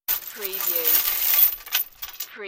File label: Medium Chain Drop To Pile